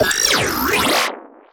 fx gargled nonsense

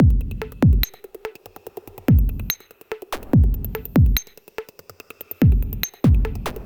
Reggae Microbe I, 72

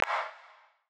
Clap

